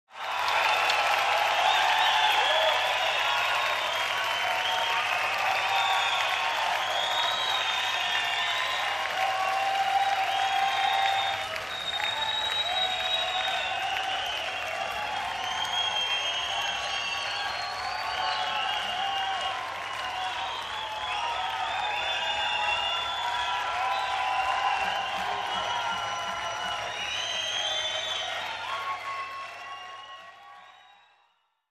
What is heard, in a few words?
Audience in an entertainment show cheering